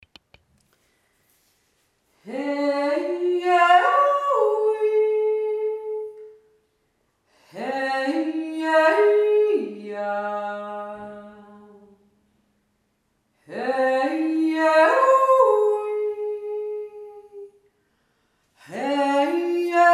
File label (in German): eissch tzenjodler